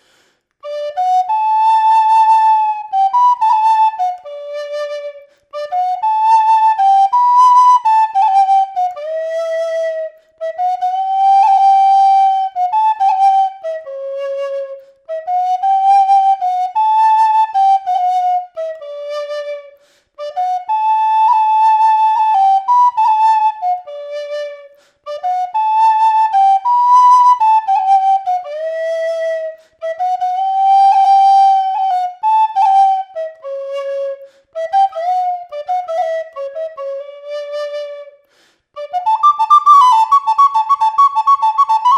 son pito guia, Pito Galego

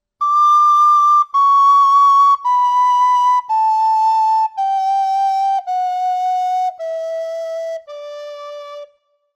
vibra dedo, Pito Galego; afinacion

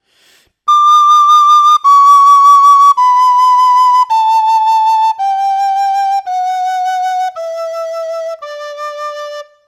vibra sopro, Pito Galego; afinacion

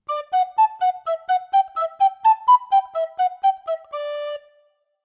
pica simple base, pito galego; articulacion; picado simple

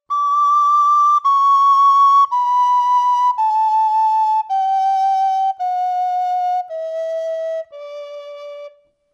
vibra dedo, Pito Galego; afinacion

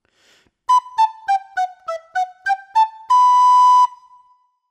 stacatto, Pito Galego; articulacion

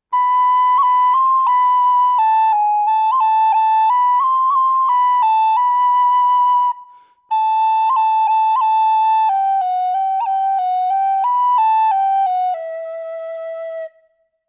trad, Pito Galego

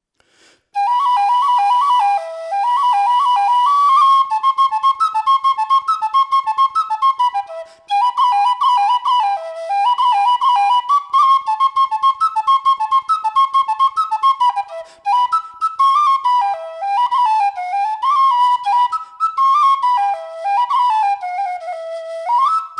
son pito

